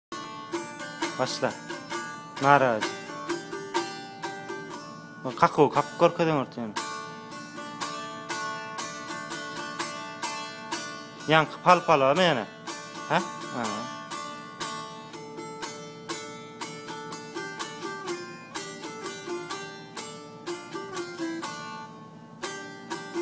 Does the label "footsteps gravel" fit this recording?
No